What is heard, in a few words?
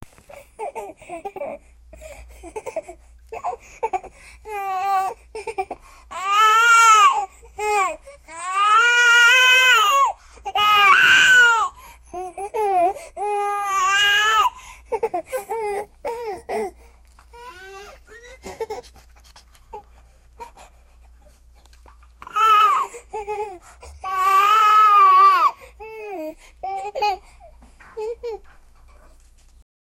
a pregnant women give bir, baby